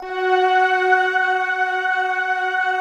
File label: future choir, Stack; Optical Media International; Sonic Images Library